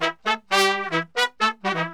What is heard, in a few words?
120 AM L, BRASS SAMBA; Best Service ProSamples vol.14; AKAI